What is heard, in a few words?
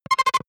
button, signale; soundpool